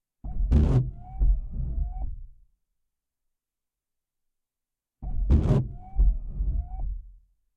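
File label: car front windshield wiper 2x short nissan note interior; sonniss gdc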